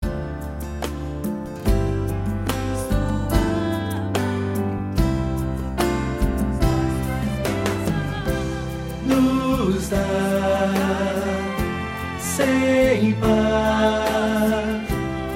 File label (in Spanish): Tenor